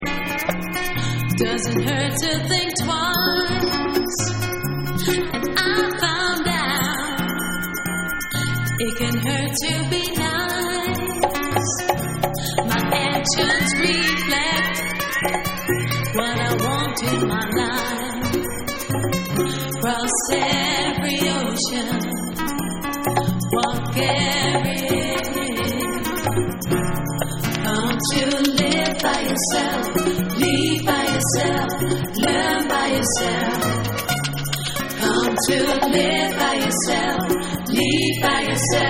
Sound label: Guitar Acapella